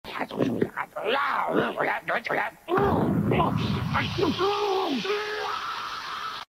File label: Angry Throwing a Tantrum; Peperami